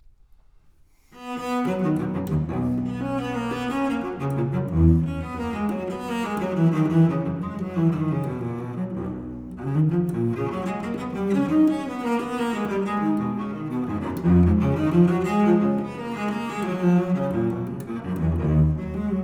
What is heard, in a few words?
Suitte 3 BWV 1009 Courante